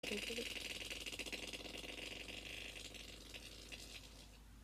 windmill fart

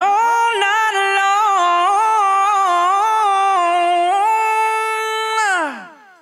cars voiture

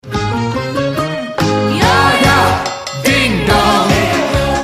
ding dong

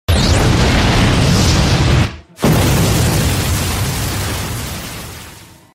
anime power sound effect; meme

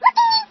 pluck

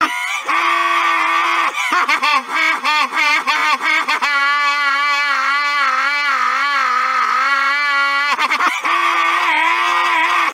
8bit drummer

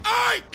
eey

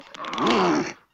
short growl; pazuzu

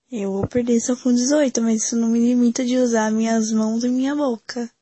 rrrrrrrrrrrrrrrrrrrrrrrr

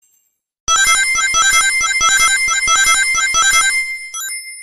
rings combo; sonic

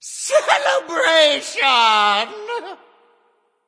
celebration

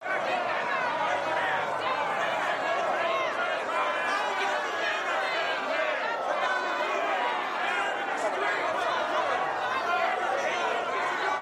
crowd

